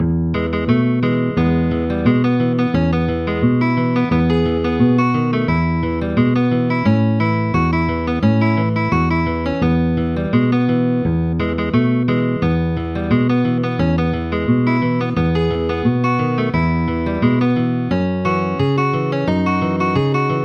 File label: High Steppin' Ragtime Guitar